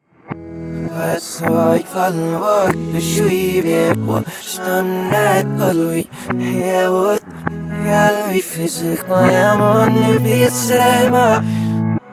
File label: song reverse